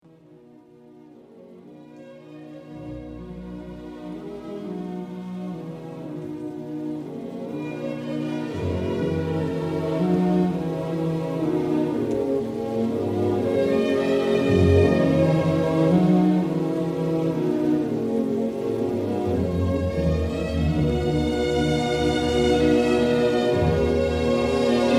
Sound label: Classical